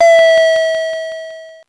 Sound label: Drum, Electro-Harmonix Spacedrum; Drums